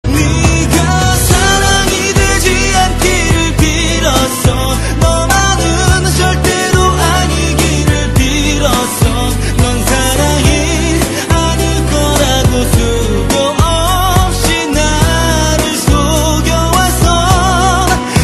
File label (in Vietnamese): tieng han